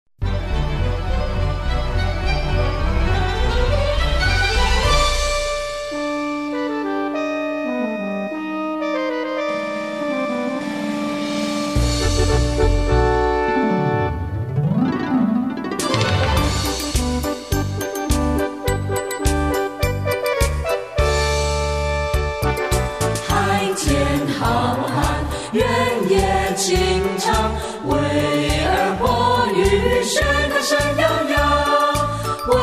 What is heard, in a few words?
hust song